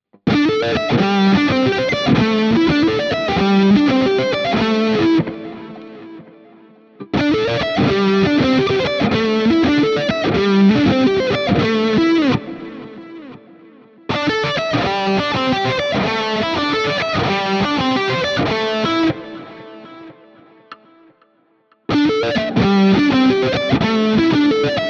Schecter Exotic Ziricote Lead Riff C all positions